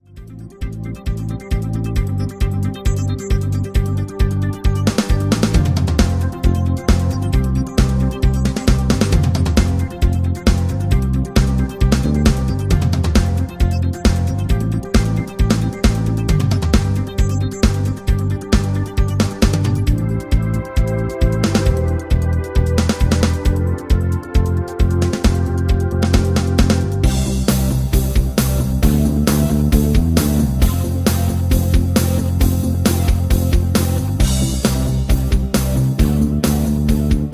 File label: rhythm